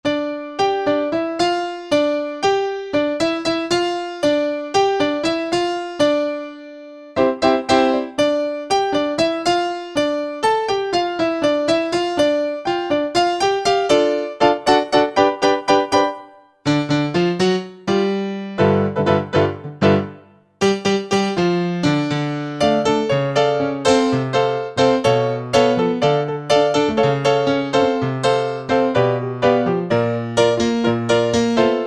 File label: Salsa Piano; World; Latin; Roland SR-JV80-18 World Collection Latin